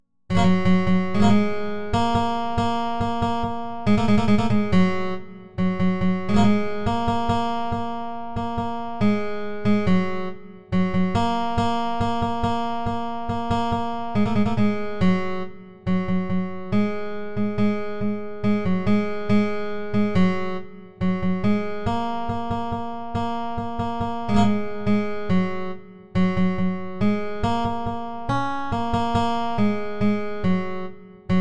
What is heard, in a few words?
guitar